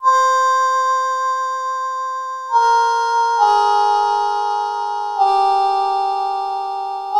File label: dx harmonica